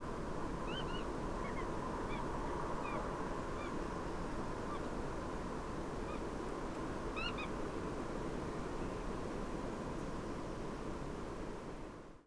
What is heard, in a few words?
Bar tailed Godwits weh weh; Poole Old Town